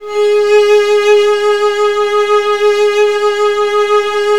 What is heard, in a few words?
Virtuoso Strings